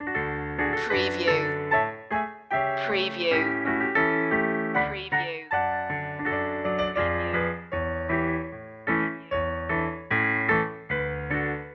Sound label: silent movie strolling loop